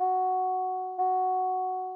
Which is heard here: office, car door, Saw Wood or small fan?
car door